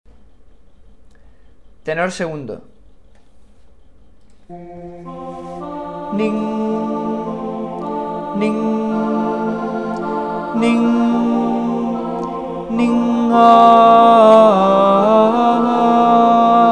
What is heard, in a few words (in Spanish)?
Campana sobre Campana Tenor II; navidad